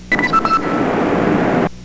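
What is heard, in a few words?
doorbell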